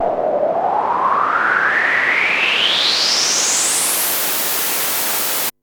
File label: Yamaha CS5, Filtered Noise